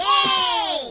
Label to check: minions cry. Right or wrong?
Right